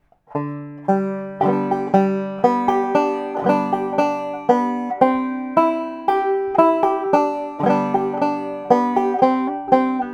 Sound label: redwing, banjo